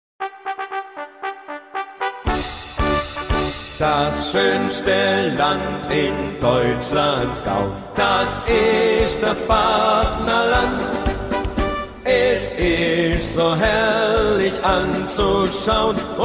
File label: ringtone